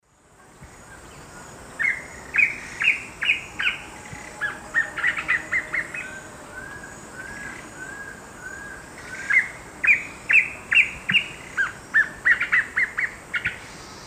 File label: Cercomacra serva, duet; S. America; Eastern Lowlands